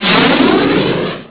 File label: fire; submarine game